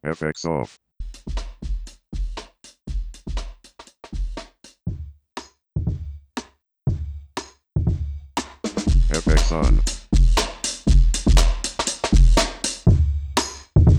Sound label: drum buss; studio one